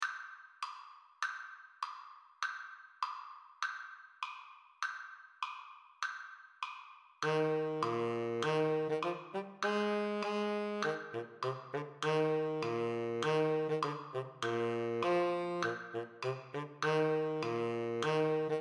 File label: Tenor Saxophone Slower Tempo; Tenor Saxophone Only - Slower Tempo